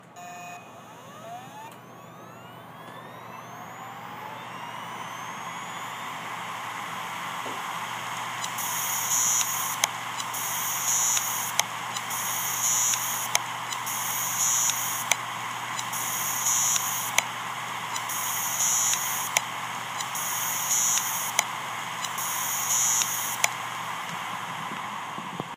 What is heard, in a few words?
Scratch